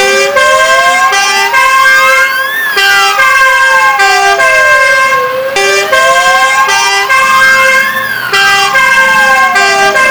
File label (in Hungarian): atego, szirena